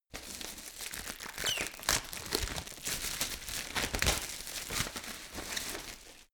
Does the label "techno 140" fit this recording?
No